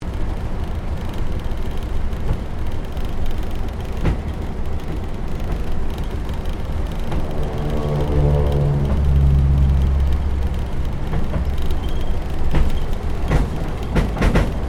AIRCRAFT CAC WIRRAWAY TAXI INTERIOR

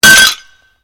GLASS SHARD SMASH

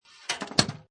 Pinball Sound Slide Clank And Clunk; Video Games

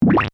Scifi, Computer, Display, Screen, Close; Computer Sound Effects

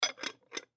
Glass Dish Set Down; Dishware (Sound Effects:Household); Blastwave; Foley

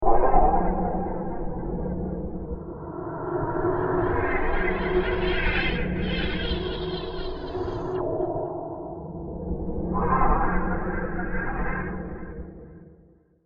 Solar Wind, science fiction sound effect; star wars / trek style

